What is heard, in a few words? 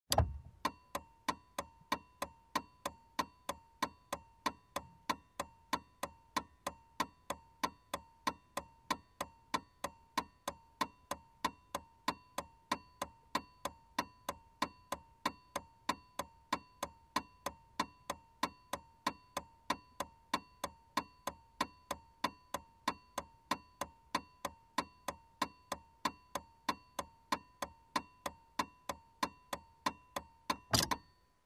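Car turn signal switching on and off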